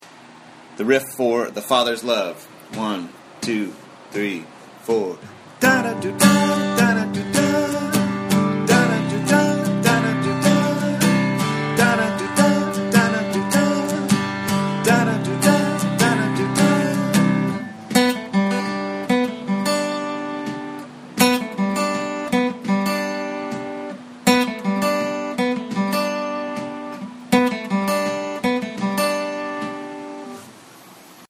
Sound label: Riff